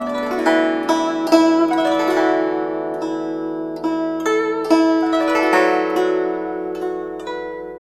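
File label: guzheng; Spectrasonics; Heart of Asia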